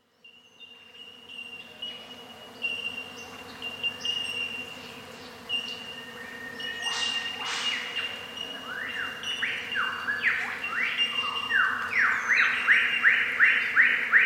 catbirds - lyrebirds - honeyeaters - thornbills and insects